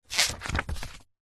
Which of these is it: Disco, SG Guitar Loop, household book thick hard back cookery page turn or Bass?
household book thick hard back cookery page turn